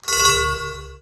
Transition Bell Chime; Production Element Title Transition

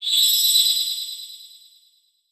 Title Transition Crystal Glow; Production Element Title Transition

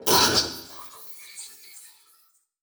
Transition Hit Impact Electronic; Production Element

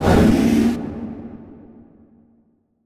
Transition Whoosh Fly By; Production Element

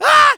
Cartoon Human Male Scream Surprise Scream

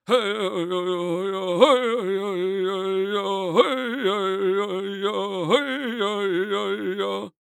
Human Indian Chant Voice

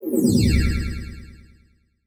Multimedia Internet CD-Rom Flash Beep Multiple

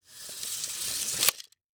Industry Tape Measure Retract

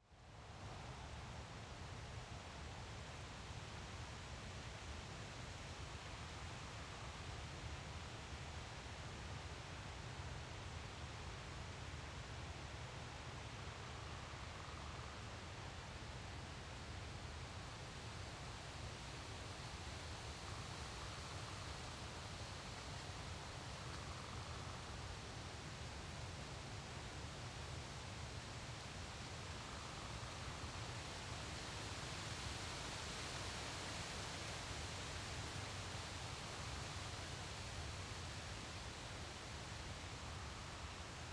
Ambience Nature Field Windy; AdobeCC

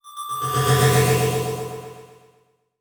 Beep Evolver; Multimedia Internet CD-Rom Flash

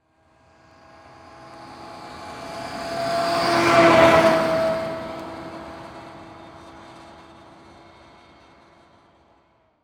Truck Ford Ranger Drive By Medium; transportation

